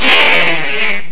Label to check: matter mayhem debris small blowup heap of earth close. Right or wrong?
Wrong